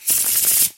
card shuffle

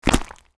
squash

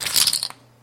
gem big drop

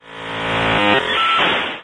hack; tyrants edge

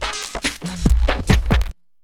bonus backpack; monsterplex

